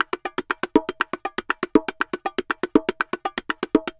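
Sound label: BONGO SALSA, salsa drums and percussion; Best Service ProSamples vol.14; AKAI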